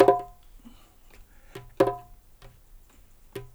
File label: 135 BPM, perc loops, dub; Drumdrops In Dub VOL-1; 130-140 BPM